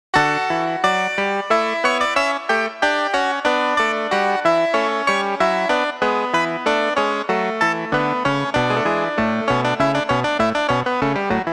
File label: Harpsi; Bach